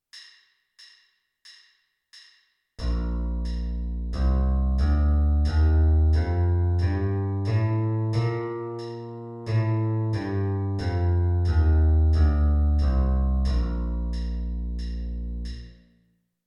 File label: b flat major scale electric bass